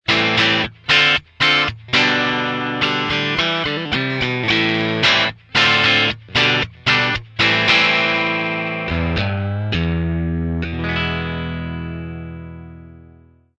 bridge clean humbucker; steel twin; axetec

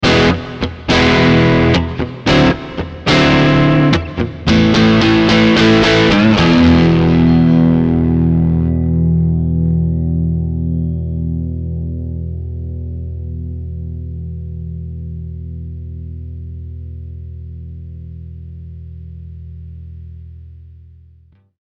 dirty neck mono; vintage 6ts; axetec

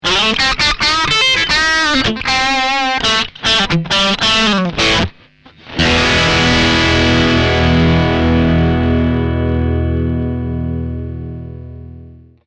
pig iron dirty bridge; axetec

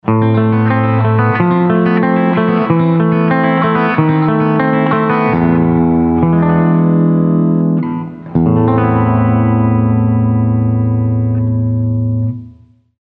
dirty torque clean; ainsley stones; axetec

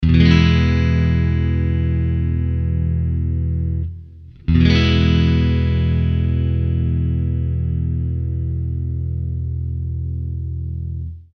clean middle mono; vintage 6ts; axetec

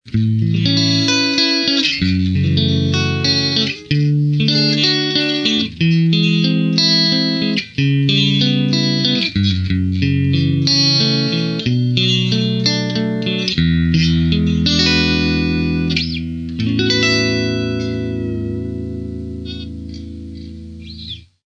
neck clean; texas loco; ainsley stones; axetec

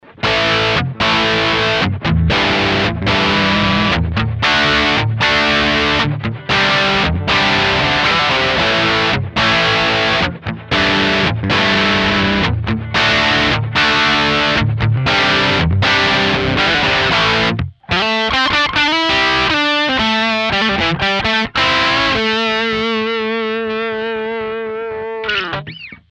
bridge dirty; rolling mill2; axetec

